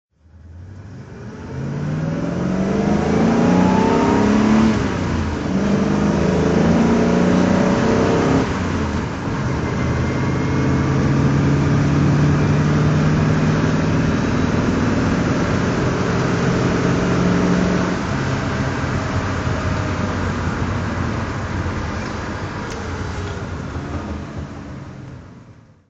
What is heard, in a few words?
maserati indy drive; Maserati Indy 4.9 liter, 1971; Motorgeluid rijdend; V3D/ ClassicarImages